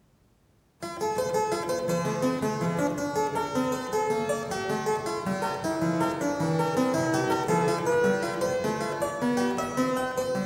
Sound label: Prelude in F sharp; Prelude 13; BWV 858; Bach - WTC - Tilney